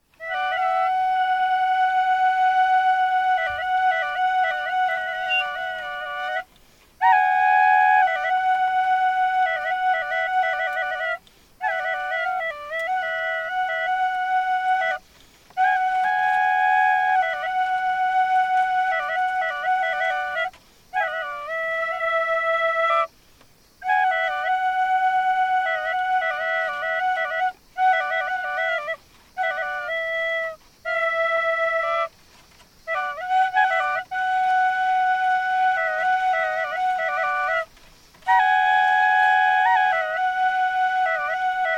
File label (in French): Flute double